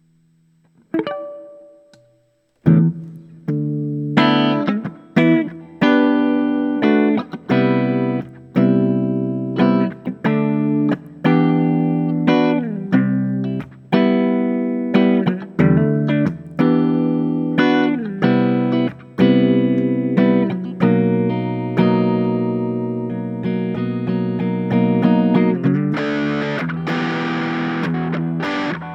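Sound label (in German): Tube Screamer cleaner Amp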